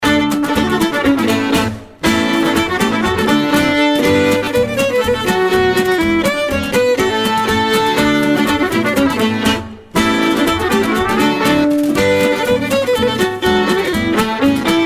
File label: polka; Violin 1 Violin 2 Flute and Whistle Rhythm